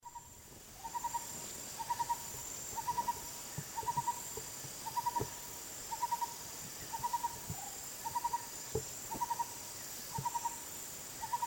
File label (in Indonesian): takur bultok